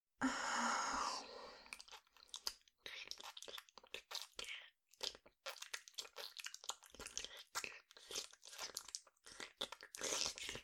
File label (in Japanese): 乳首舐め（右）, 舐め音のみ